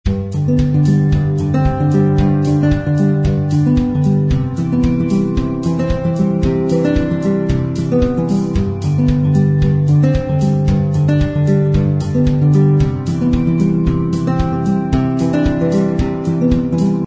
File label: Verse No Piano; 音乐素材 夏日温馨套装 Warm Summer Kit